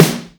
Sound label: drum hit whistle; JesusOmega {NM} 『Planets』; glass